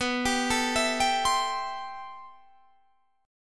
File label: A-Minor 7th B; Am7/B; arpeggiated